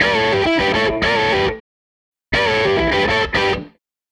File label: Gretsch Guitar Loop